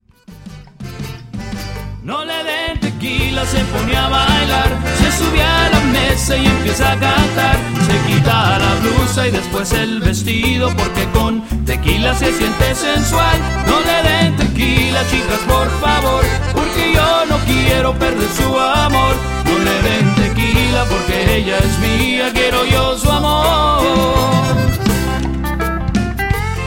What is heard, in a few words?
Cumbia Version